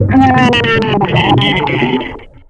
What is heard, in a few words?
gurp; quake2; coop; quakeguy